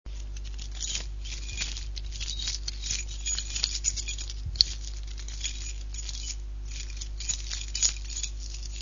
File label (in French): texture cles